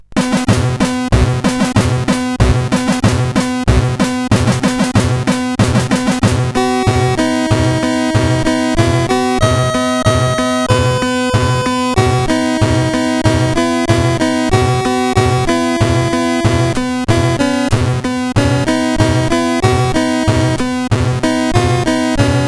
Military CSG8580R5; Mermaid

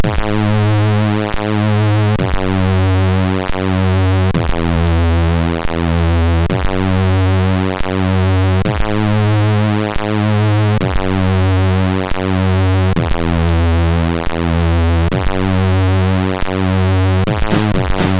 Beat Mania MOS6581R3; hvsc

